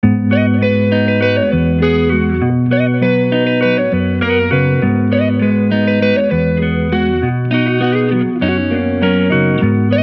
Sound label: 100 BPM Gm; Fireside